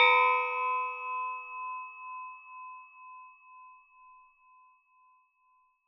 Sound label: Metal Teapot Stand Strike